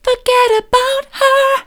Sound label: forget about her soft, vocal